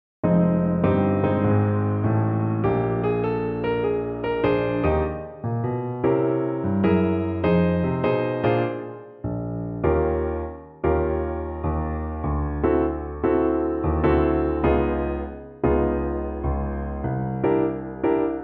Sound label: piano